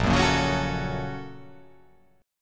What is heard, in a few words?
A sharp Minor Major 7th Sharp 5th